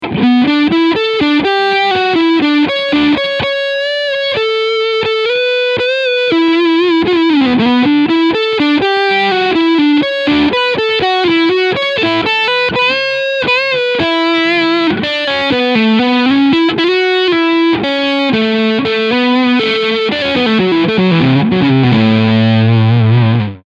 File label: Harley Benton Fusion II HSH Leadsound Front Pickup